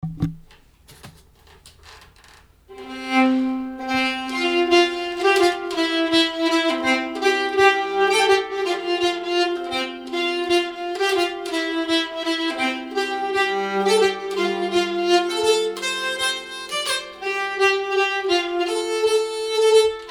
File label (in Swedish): jämtsnoa i f dur